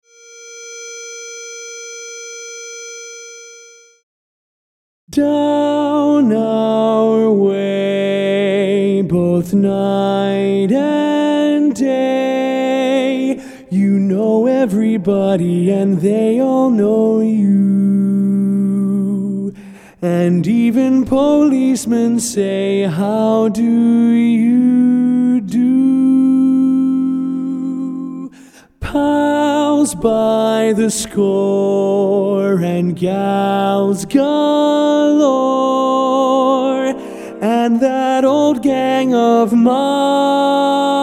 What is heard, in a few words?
Lead Predominant